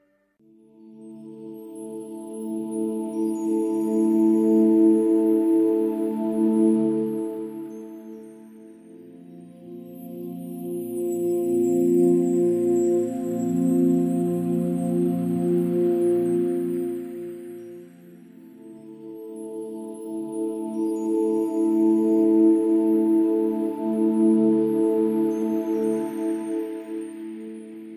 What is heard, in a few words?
ambiente ethereal void